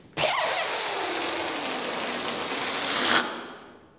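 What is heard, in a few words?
Farting Flying Balloon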